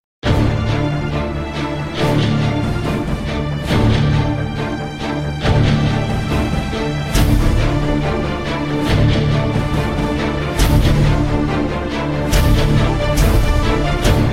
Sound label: High Tempo Music; entwined 2 the perfect murder